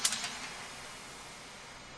Close

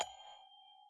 DING

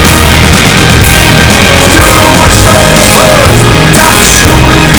hero loud filtered

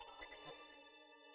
NOTIFY

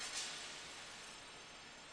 TADA

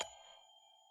DING

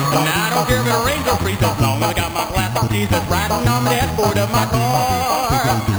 16bit 5kHz

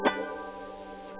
Musica Asterisk

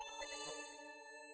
NOTIFY

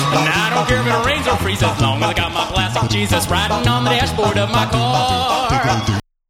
drop

